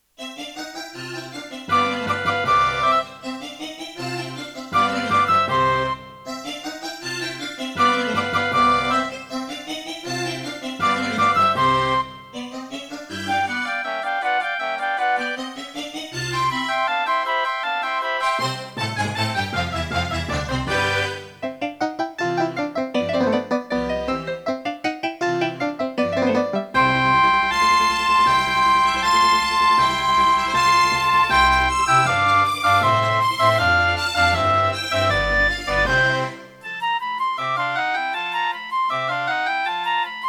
Concerto partial, Serdaco Dreamblaster; classical; Mozart; Concerto K467 partial